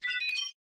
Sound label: global notification